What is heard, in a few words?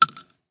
Shell 12ga gravel; STALKER; IWP